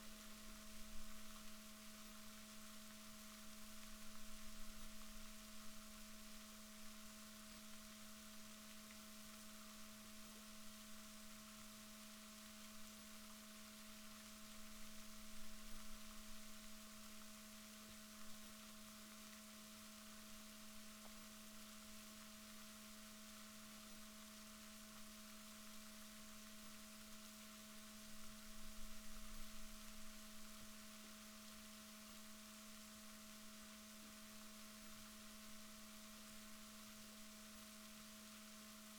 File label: rorquals positif, Balaenoptera Physalus Chile